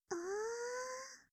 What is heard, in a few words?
breath